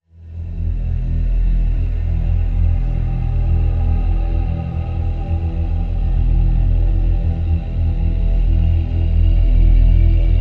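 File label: drone E; Crevice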